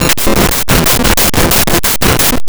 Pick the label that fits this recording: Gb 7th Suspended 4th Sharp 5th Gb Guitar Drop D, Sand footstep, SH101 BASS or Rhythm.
Rhythm